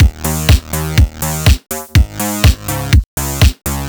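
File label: 123 bpm italo disco full, combi loops; Nu Dance Electro; Zero-G; Soundclash; Acidized Wavs; Italidisco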